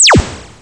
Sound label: se cancel